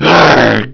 arrrgh; Army Men; GreenUnits

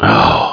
Aah; 1997 MMX LAN PACK (win 95); Army Men

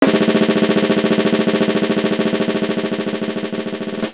Military Cadence Firing Line; 1997 MMX LAN PACK (win 95); Army Men

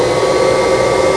Vacuum run; 1997 MMX LAN PACK (win 95); Army Men

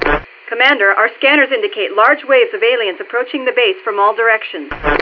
Large Waves; Army Men

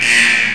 Alarm Klaxon; 1997 MMX LAN PACK (win 95); Army Men

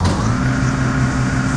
scan; 1997 MMX LAN PACK (win 95)

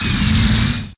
ATTACK; 1997 MMX LAN PACK (win 95)

